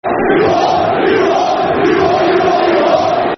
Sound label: You What Football Chant; Nottingham Forest Nffc